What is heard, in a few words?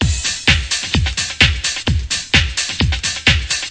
130 bpm, euro breaks